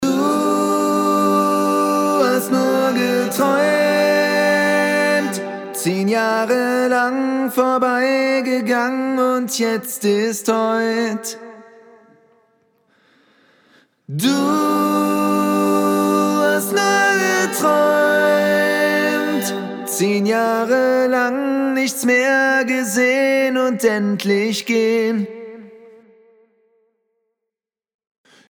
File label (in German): acapella